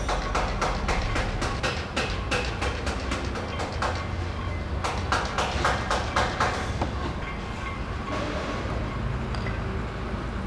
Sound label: build; Mafie 1